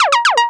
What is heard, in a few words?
Techno Bleep